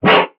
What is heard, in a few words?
wolf hurt